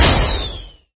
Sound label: blast